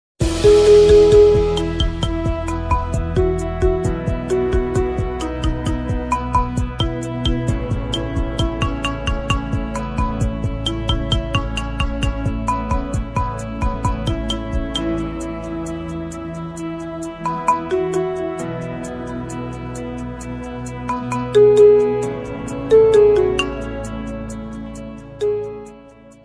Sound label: Marimba